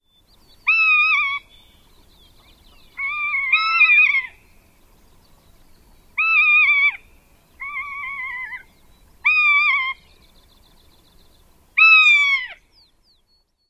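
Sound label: ROLEHA calls, rough-legged hawk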